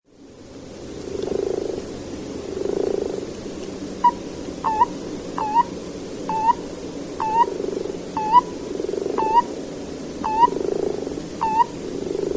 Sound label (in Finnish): rupikonna; vahto